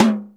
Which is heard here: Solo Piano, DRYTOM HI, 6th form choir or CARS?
DRYTOM HI